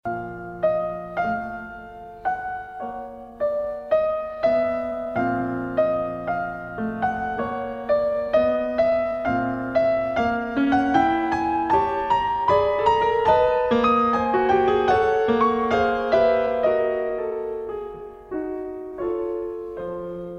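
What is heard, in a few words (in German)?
Klavierstücke Andante con moto e rubato; Heinrich Schenker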